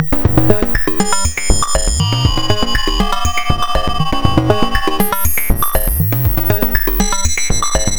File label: FM Granular Loop; Sonic Foundry; The Electronic Music Manuscript Collection; Drum Loop Structures